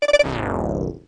wrong

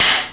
Clapboard; iPoker 2000

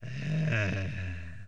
TREANTS

